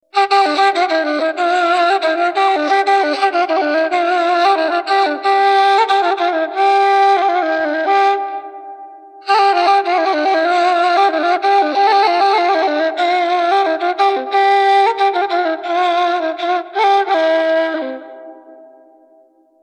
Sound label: nei